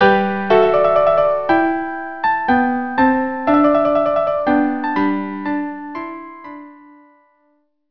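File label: sarabande violin viola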